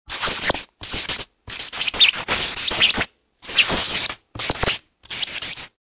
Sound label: Magic Marker